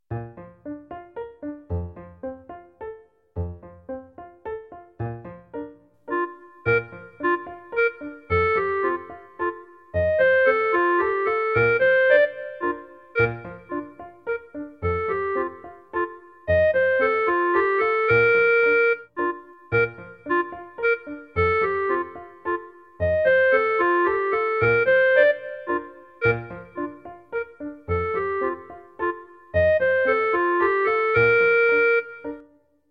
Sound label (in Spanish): Sonidos de la orquesta CLARINETE; musica I ESO 2015